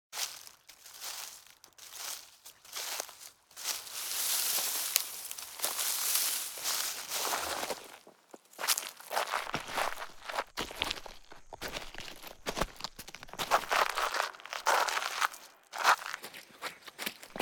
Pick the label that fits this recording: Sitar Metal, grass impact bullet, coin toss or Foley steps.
Foley steps